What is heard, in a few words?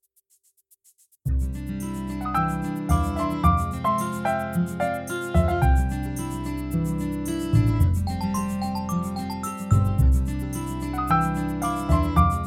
piano silk ringtone